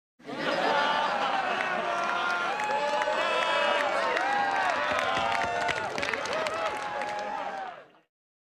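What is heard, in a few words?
Crowd 50 With Cheers Applause; medium int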